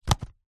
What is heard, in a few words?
wallet leather set down on hard surface; foley; zapsplat